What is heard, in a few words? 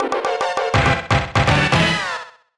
Race Fanfare; Super Mario Kart